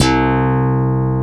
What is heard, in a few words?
GTR SYNTH, Clean E.Gtr; Roland LCDP02 Guitar and Bass; Hookin'Pick